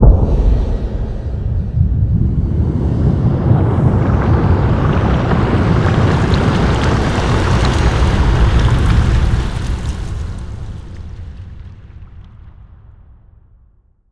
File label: explosion; ARMES; geck